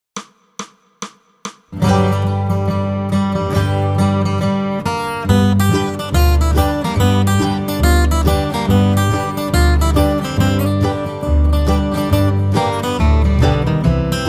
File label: Carroll County Blues Guitar 140